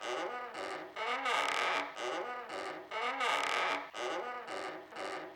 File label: swing